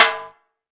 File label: INS TIMBAL